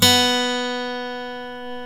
GUITAR FLUTE

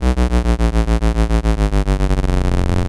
Osc vs Ring Mod, vintage oscillator; radiosonics

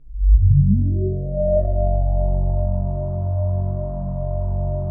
F#1, KORG DW8000, Vintage Keyboards; Big Fish Audio - First Call Vintage Keyboards

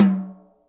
Jazz Hi, Acoustic Tom; Yamaha DTXpress; drum machine

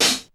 XE8 CLHH; Hollywood Edge; The Big Bad Beatmachine

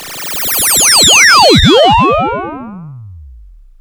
OSC FX, OSCILLATOR FX; Zero-G Ambient 1

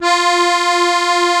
ZUPAN CHRO, AKKORDEON; Best Service; Alpine Volksmusik

